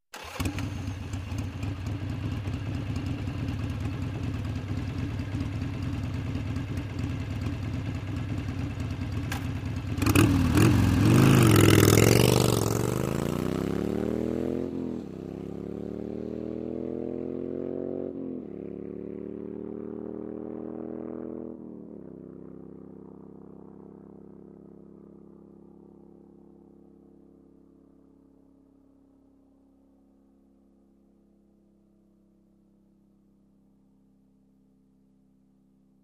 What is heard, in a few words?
Motorcycle starts, waits, drives away